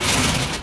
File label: door metal rusty move; doors; Team Fortress 2